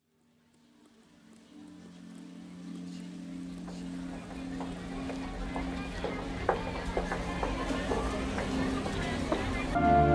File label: Romantic